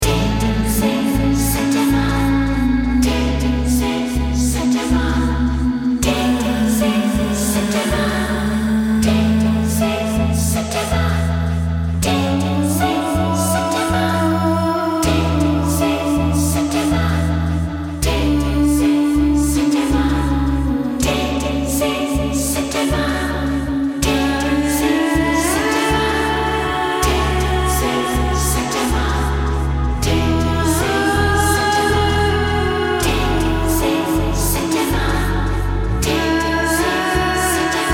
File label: Healing Melody